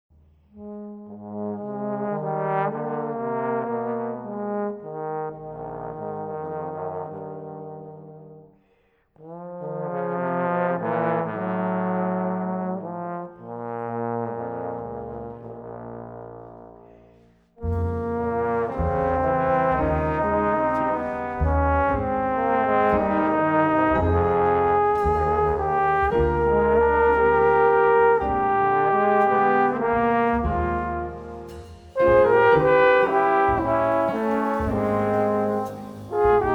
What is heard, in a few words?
2 TB